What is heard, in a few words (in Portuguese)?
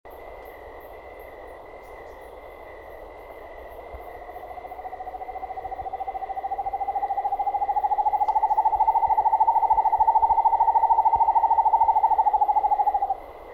canto do corujinha sapo